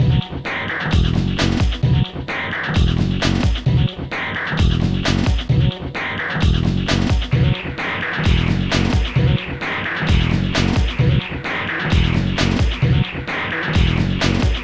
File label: Funk, Hardcore Breakbeat, 131 F#min; Big Fish Audio; Hardcore Breakbeat Action; Funk Factory